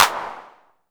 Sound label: V9 Clap; DIRTY 9